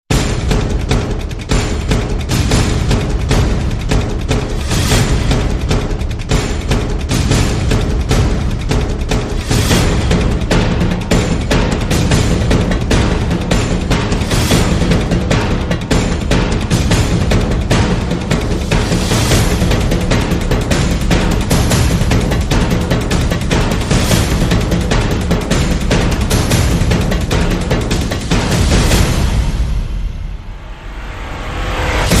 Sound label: Drums Unstoppable